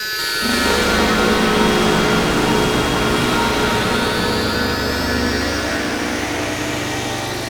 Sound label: Long Decay, FX; Sonic Foundry (Sony Creative Software); Underground UK House & Electro